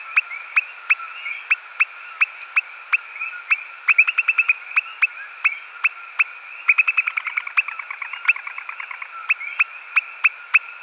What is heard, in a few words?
Leptodactylus albilabris; Yunque